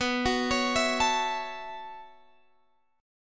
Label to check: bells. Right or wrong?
Wrong